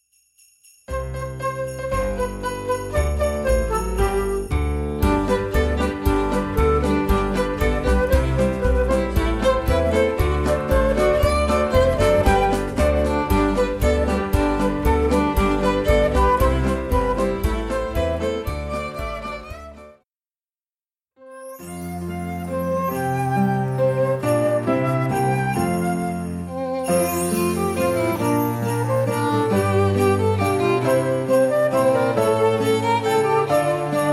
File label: Play-Along Recorder Christmas